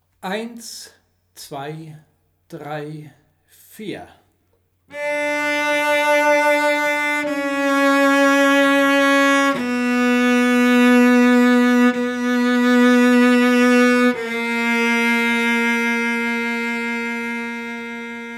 Stimme A; Burbach; Vivaldi

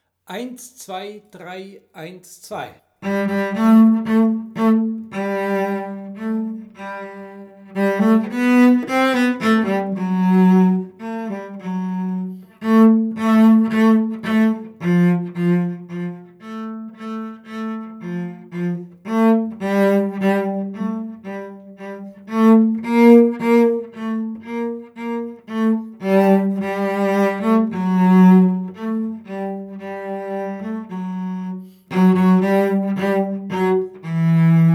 Echo Chorus Stimme B; Heilsbronn; Purcell

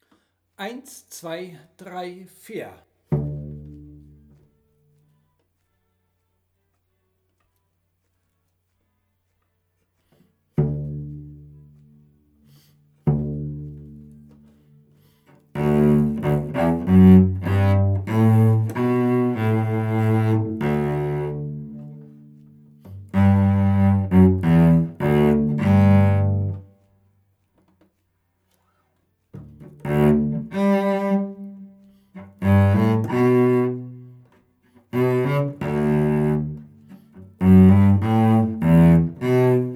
Stimme E; Reimlingen; Respighi

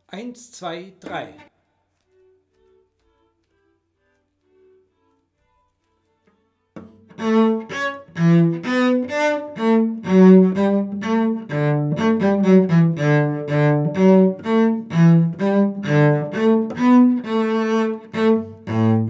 Stimme D; Reimlingen; Respighi

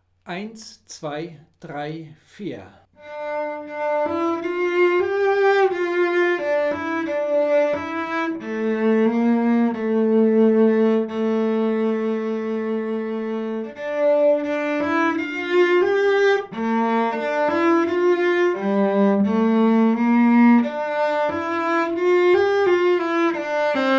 Stimme C; Reimlingen; Respighi

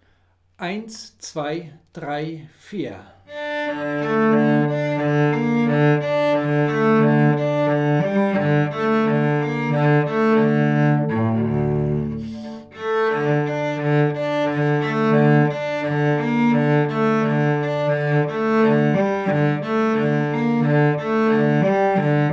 Stimme D; Reimlingen; Respighi

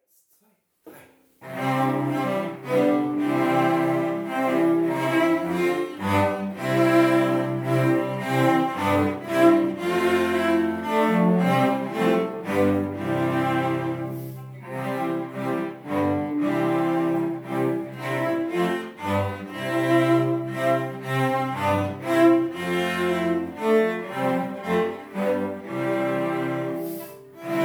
Menuett in C; Lauenen

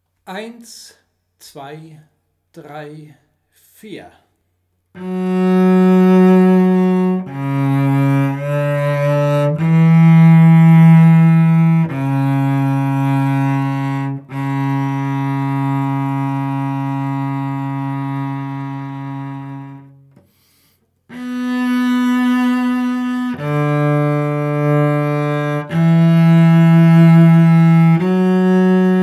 Vivaldi Satz 2 Stimme C; Burbach

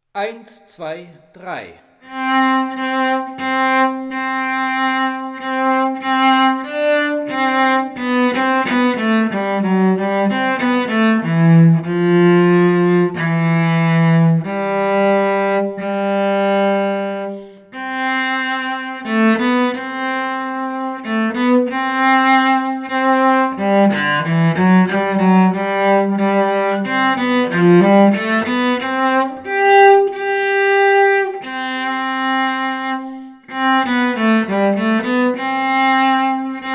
Pavane Stimme B; Milan

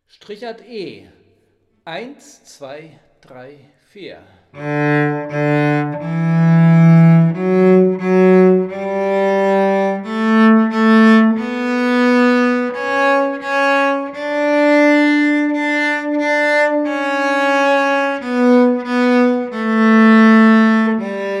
Tonleiter Strichart

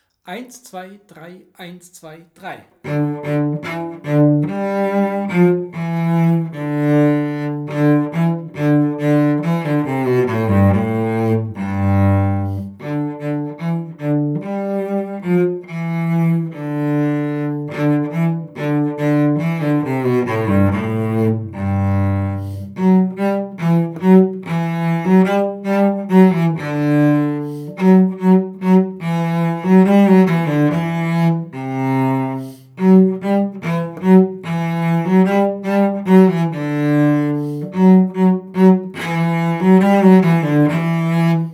Vc C; Gervaise; Gaillarde

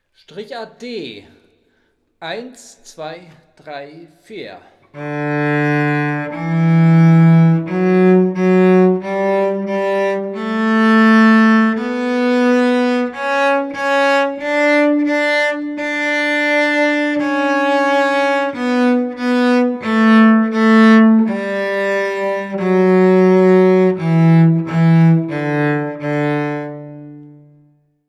Tonleiter Strichart

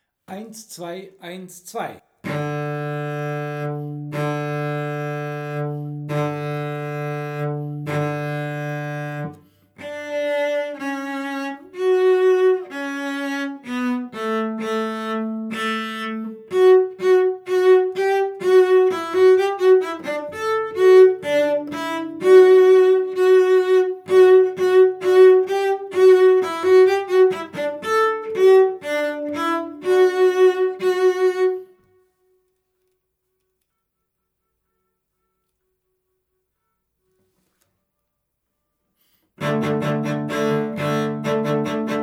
Stimme C; Reimlingen; Respighi

